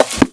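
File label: book close; The Elder Scrolls III - Morrowind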